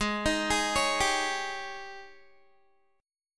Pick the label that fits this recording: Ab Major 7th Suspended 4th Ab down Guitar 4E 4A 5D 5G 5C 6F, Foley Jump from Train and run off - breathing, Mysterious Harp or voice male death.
Ab Major 7th Suspended 4th Ab down Guitar 4E 4A 5D 5G 5C 6F